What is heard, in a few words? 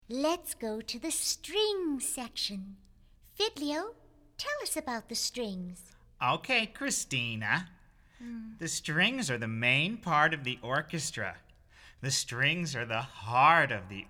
String Section